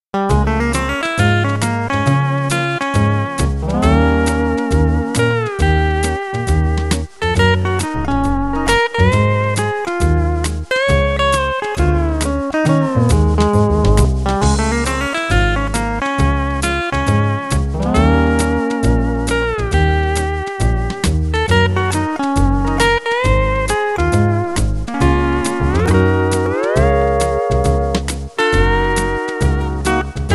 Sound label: Valco Swing, steel guitar